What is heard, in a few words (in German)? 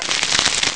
knarr; populous; Amiga Soundtracker Sample Packs